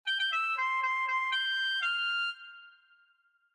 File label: chickadee